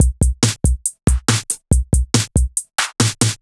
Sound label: Break Beats, 140 BPM